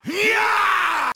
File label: Yell; SSGSS Vegeta